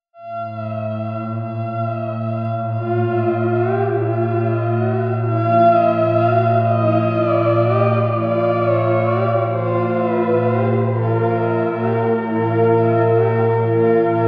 Sleepy and simple harmony of a sort, Miniature Theremin; ringtones